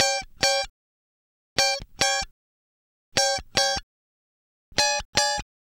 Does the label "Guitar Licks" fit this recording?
Yes